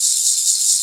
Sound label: Maraca Swirl; Planet Earth; Digital Sound Factory Planet Earth